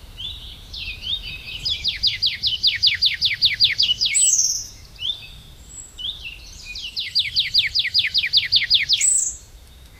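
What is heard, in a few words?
birds chirping in a forest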